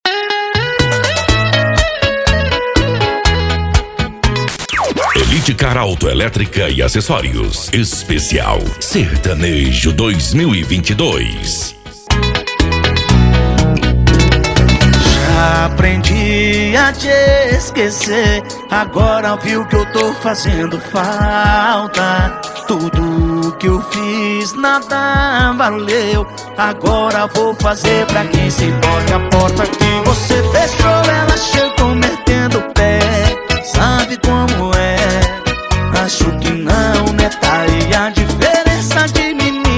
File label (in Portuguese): sertanejo